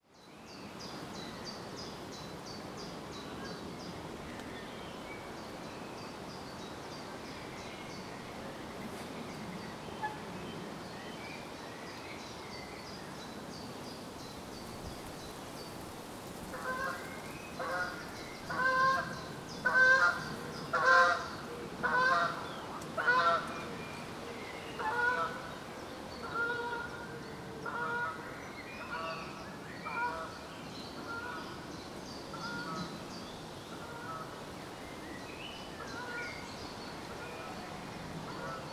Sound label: Bird, Soundscape